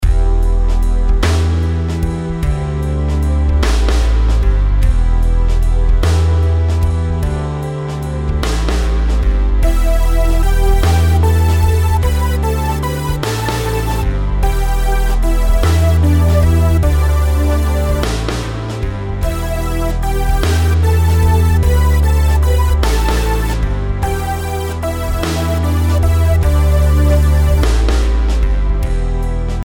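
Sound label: melodies compound 8s E minor; melody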